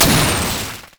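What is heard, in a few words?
fireworks missile barrage; Fireworks Corki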